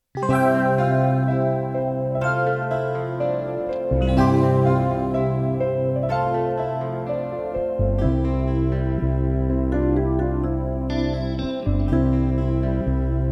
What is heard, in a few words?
Backing Track